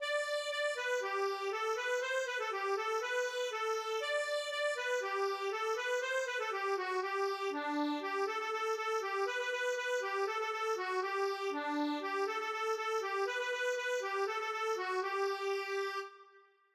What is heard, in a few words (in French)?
ronde, voix1